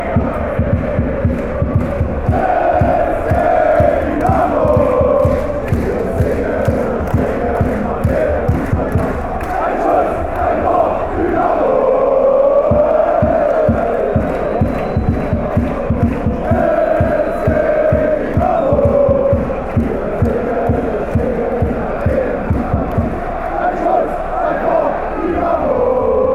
one shot goal, fanchants

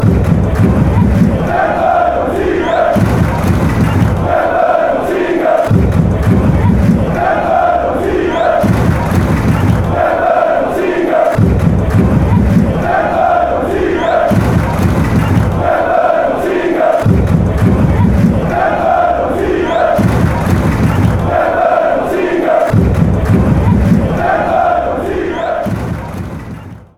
fight and win, fanchants; eintracht

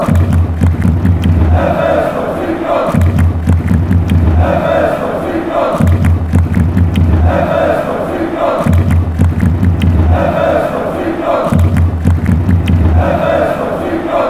fighting, fanchants

